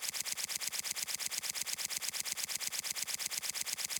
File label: risk draw loop; gambling; CrissCross81WD; wazdan